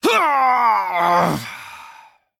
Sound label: Death